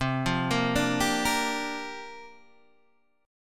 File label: C 7th Suspended 2nd Suspended 4th, Guitar, Open D